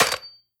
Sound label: give orders invalid; Hearts of Iron IV Death or Dishonor